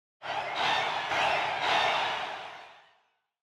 Win Cheer; PAPER MARIO Color Splash; wiiu

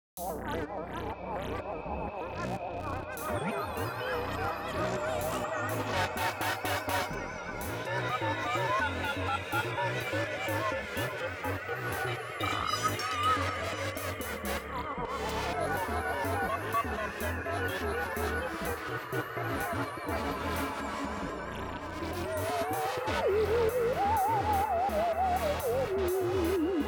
BGM BTL Reverse; PAPER MARIO Color Splash; wiiu

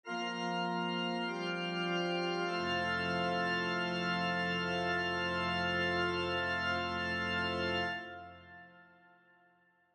Battery; PAPER MARIO Color Splash; wiiu

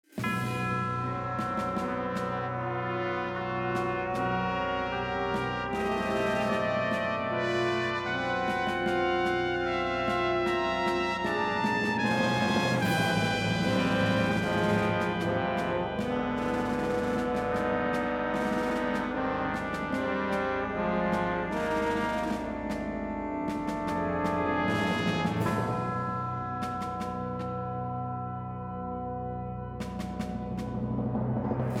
BGM Battle Ship; PAPER MARIO Color Splash; wiiu

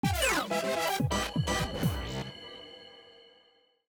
BGM BTL Reverse Intro; PAPER MARIO Color Splash; wiiu

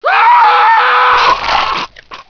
death; RPG Maker; Wartools v1.14

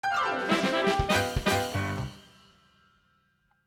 BGM BTL Normal Intro; PAPER MARIO Color Splash; wiiu

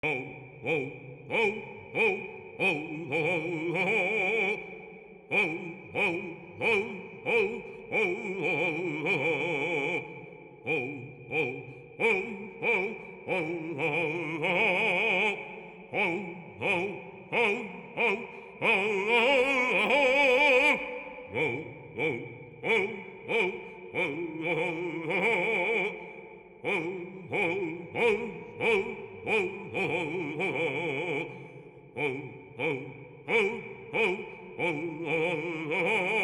BGM Pirate Song; PAPER MARIO Color Splash; wiiu

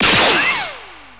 ki blast; DBZ

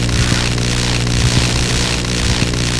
ambient electric hum; RPG Maker; Wartools v1.14

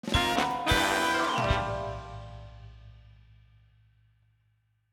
Quiz Correct; PAPER MARIO Color Splash; wiiu

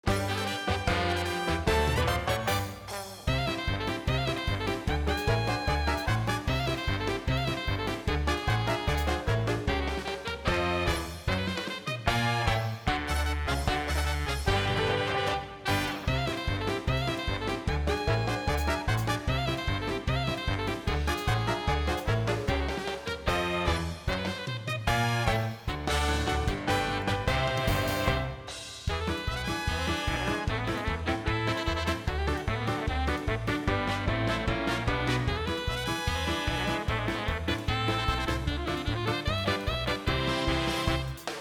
BGM Quiz Game; PAPER MARIO Color Splash; wiiu

